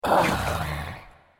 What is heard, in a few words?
spirit death; New Yankee in King Arthurs Court